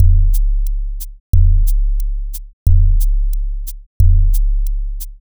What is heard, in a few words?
drums 808 bass